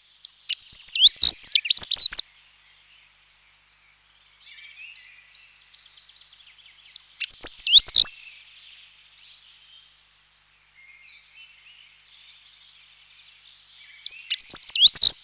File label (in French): accenteur; faune